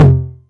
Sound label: tom mid, Novation Drumstation